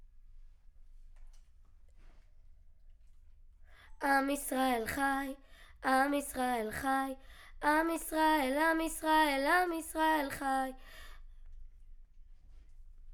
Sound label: Children Choir; Studio Bekol Children Choir